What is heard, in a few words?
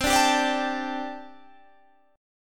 C 6th Suspended 2nd C